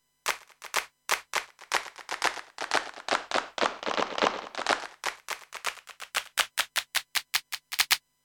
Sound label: Roland SPD ONE ELECTRO Standard Clap Tuning